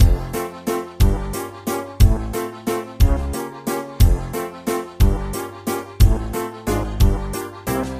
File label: music loops; Kenney